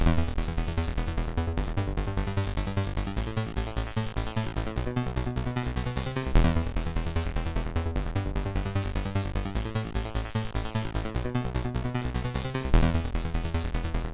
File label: Wasted 80s, sid MOS6581R3; hvsc; Zerozillion